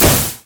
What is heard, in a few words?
grenade; rumble